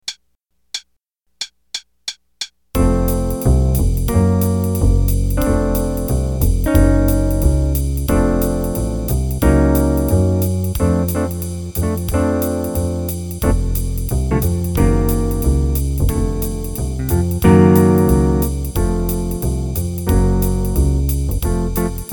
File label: Play a long, 3 choruses qn=180, Jazz Improv Play-a-Long